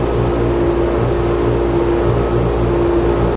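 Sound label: elevator ambience; hl2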